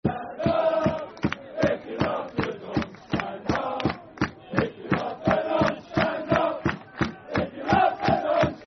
Stand Up If You Love The Football Chant (Soccer Song); Stand Up If You Love The Dons; Milton Keynes Dons Mkdfc